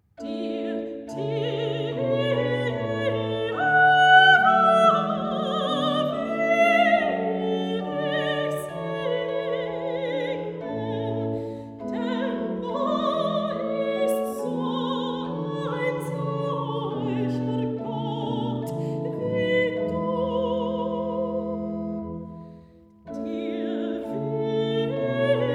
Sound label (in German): Choral- Dir, Dir, Jehova, will ich singen; J.S. Bach; BWV 299; Notenbüchlein für Anna Magdalena Bach